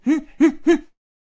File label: Blowdarter Attack Vocals